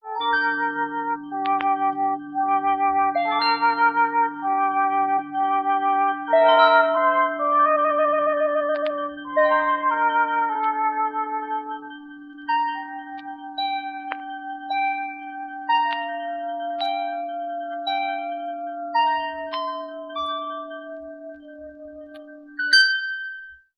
flute and keys of sorrow, Loops, Sad

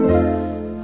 zoom, Guitar; Notes

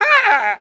"ha" cartoon; ooh ahh

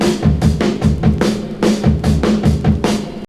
loving horns, Funky Drum loops

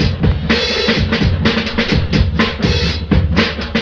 messy noise, funky drum loops

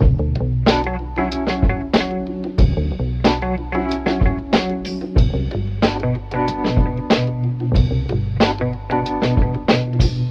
full, Loops Phonky

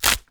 bone break

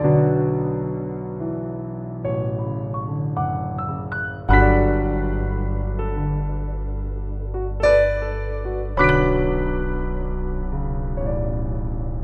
piano, sad

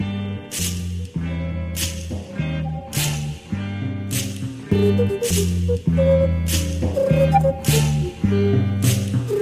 flute, Loops

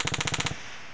machine gun; Crash Bang Boom

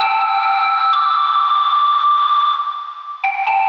air escaping, Electronic Loops

